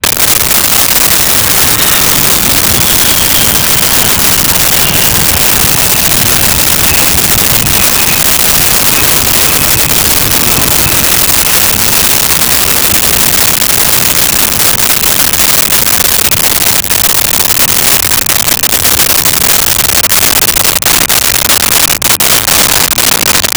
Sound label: A10 Attack Jet; Aircraft